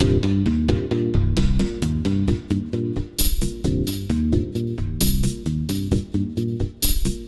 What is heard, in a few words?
Funky 125-132 Cm7; Loops